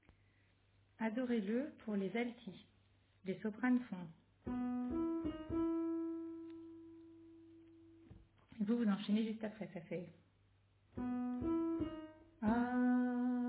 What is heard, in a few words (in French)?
Alto